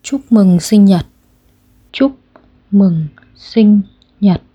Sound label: chuc mung sinh nhat happy birthday